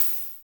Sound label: HH, HH Synth